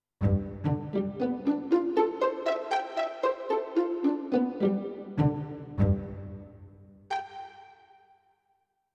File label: Pizzicato Str